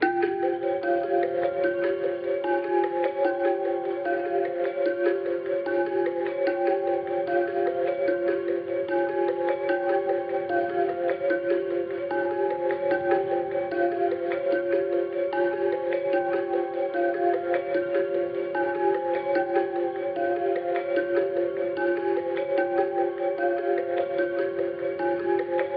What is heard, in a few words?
149 BPM F Min Bell, loop stems; Cymatics; 2022 Melody Collection Platinum Expansion - Pt 2; Pearl